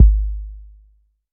boomy kickdrum sound G sharp key, kickdrum sample tuned to the G# note; kick drum samples